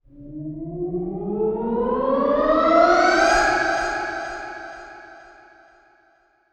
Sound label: swoosh; Loopmasters; Funky Underground Vol 2; FU2 SWOOSH FX